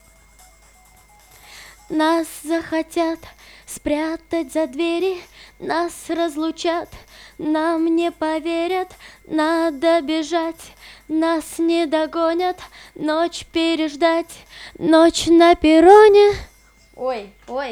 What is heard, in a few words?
VOX Couplet, acapellas